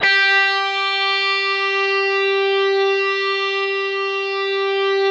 DIST GUIT, GIB SG, G4

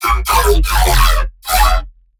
BASS SHOT Comb

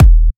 Sub Kicks, Bassdrums

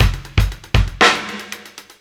live beat; beats; Sonic Foundry (Sony Creative Software); Ugly Remnants VOL-2

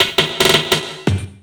LOOP SD, 168 BPM, Drum & Bass

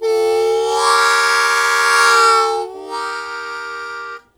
Blues Chord Attack Eb1, Harmonica; 90 s Sample CDs; Zero G

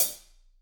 HH, Drums; Bob Clearmountain Drums I

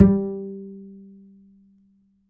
D, Upright Acoustic Bass

